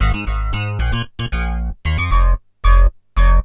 Synth hits and riffs

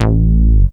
SBASS; USB Soundscan vol.31; Miro s Grooves; AKAI

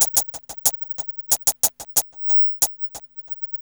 RB 92 H FX LP 3-L, Hip Hop R_n B; USB Soundscan vol.19; AKAI

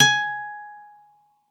Soft G#5, Campfire Nylon guitar; Worra's Campfire Nylon

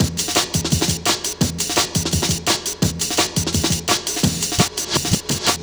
Dope Break, Drum & Bass; Fluid Dynamics

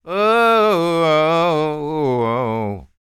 SING, Blues Soul

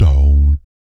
PERC, Vocal, Jazz FX, BASS PERC

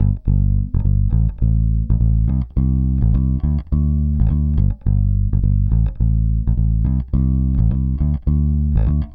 Pop, Bass

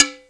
cowbell; East West - Global Sounds; AFR.BRAZ

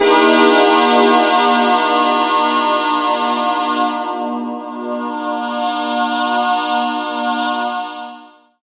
Pad Digi; Jungle; Pads; Zero-G Jungle Warfare 1, 2 & 3; 90 s Sample CDs

